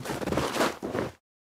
snow scuff; firefight

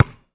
Street Ball Bounce Concrete; SFX Kit

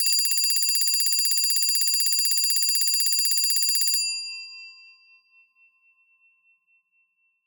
Alarm Clock Bell; Clocks, Watches and Timers; Blastwave

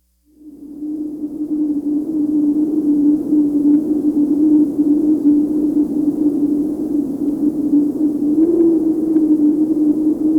Walking Machinery Trucks; Valentino Production Sound Effects Library CD50

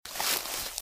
Footsteps - Bush walk - Left; Eden; RadwinIsland

